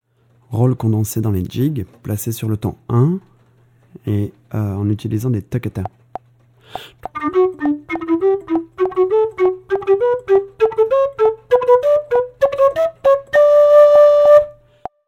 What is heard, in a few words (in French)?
roll condense jig, irish flute